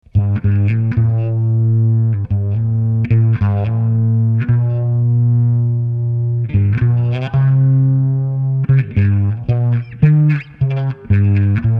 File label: zipper loop